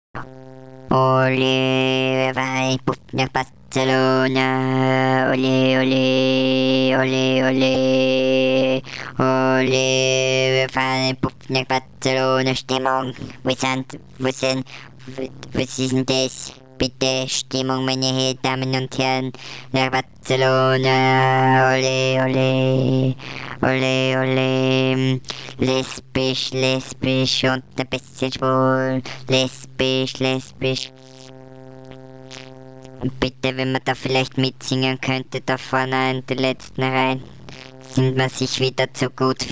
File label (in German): Busfahrt